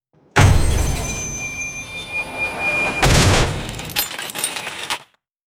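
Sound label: successful heist robbery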